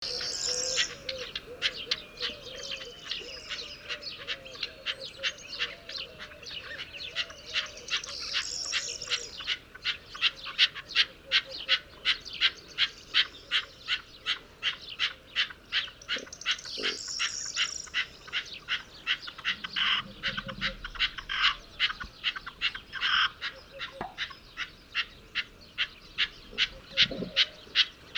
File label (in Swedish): rodhona